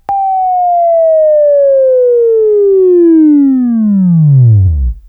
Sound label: WAV Left, Sweeping; Labview-Program; Sweeping Training program